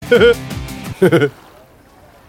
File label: giggle